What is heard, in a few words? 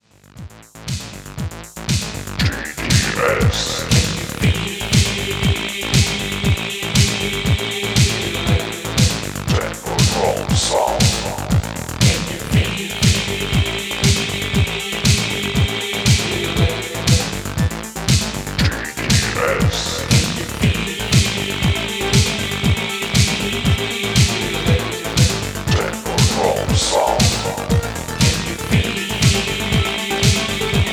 Techno Drome Sound